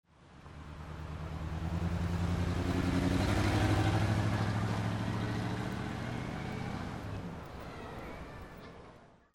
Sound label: CARS